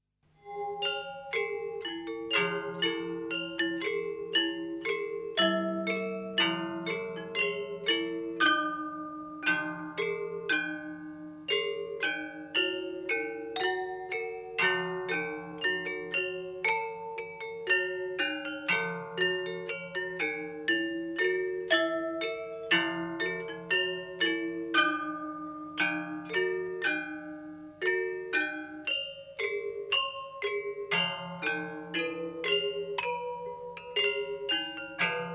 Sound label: selunding gending; Asak; Ukir Padelegan; gendingkale; 1972; Pabelan; Batara Muter Anom